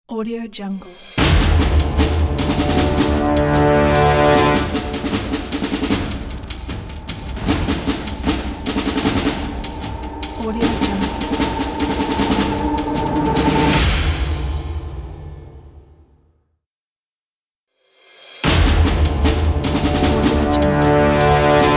cinematic epic ident

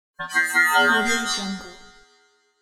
technologic computer alarm system

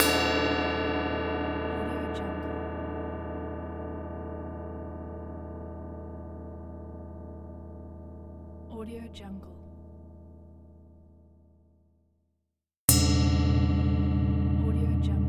big cymbal gong hits high

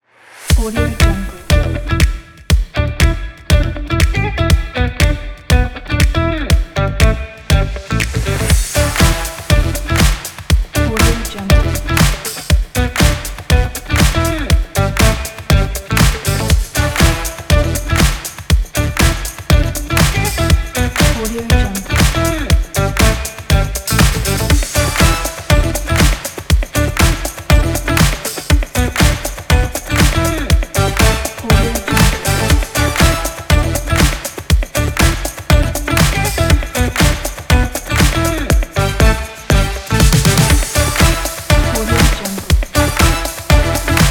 the funky groove

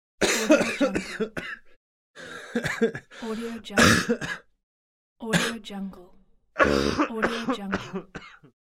man cough

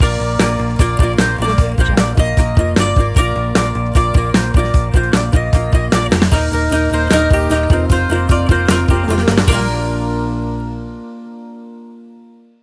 acoustic ident; audiozen

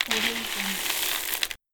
small hard debris rustle; stormwaveaudio

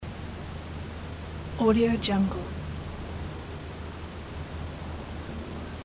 quiet ambient

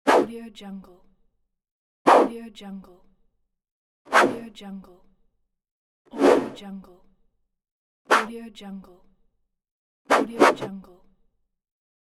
swish

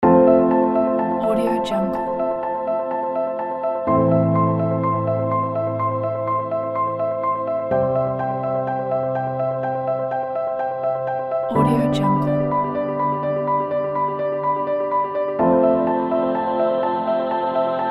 hopeful piano

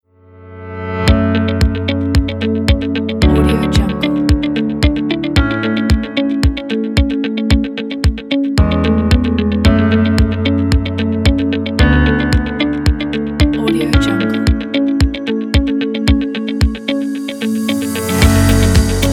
piano inspiring corporate

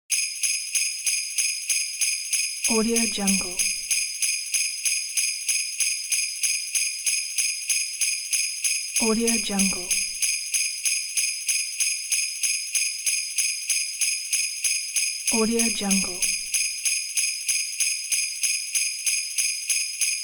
christmas bells; pure-noise

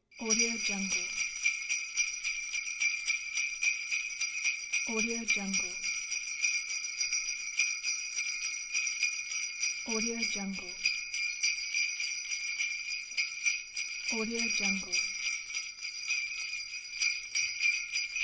jingle bells

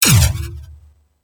impact power blast layer; robotic lifeforms 2; soundmorph

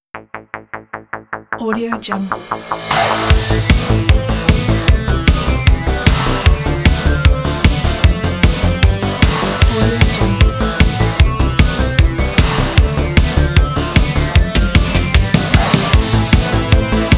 80s sport rock logo

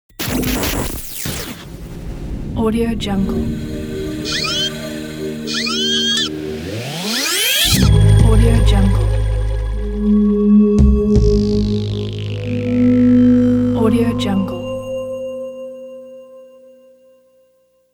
radio jingle ident

